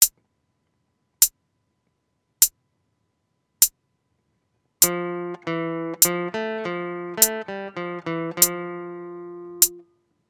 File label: Melodic Dictation